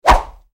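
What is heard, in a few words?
Medium Speed Air Punch, whooshes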